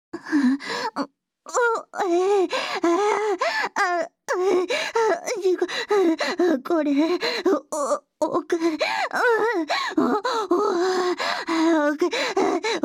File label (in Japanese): オホ声; SEなし